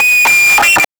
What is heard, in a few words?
electronic